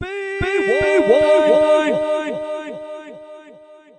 wind echo; MALE MISC and SPOKEN WORD

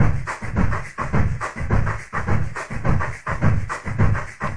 210 bpm breathy bossa, DRUM LOOPS and BREAKS

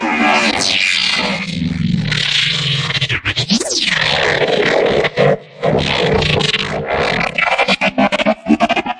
transforming robot down and wobble up

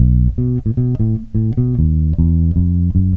151 funky finger bass

